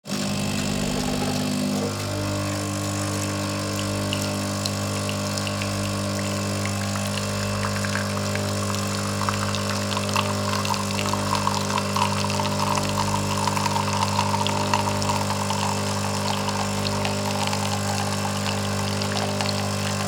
Coffee machine brewing milk

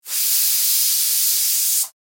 Cooking steam release hiss burst

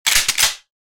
Shotgun fast racking pump action

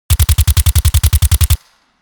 MP5 submachine gun full auto fire; war; gfxsounds

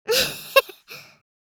Young girl giggles

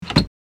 Blind lock mechanism locking; blinds; gfxsounds

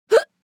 Woman hiccup short female

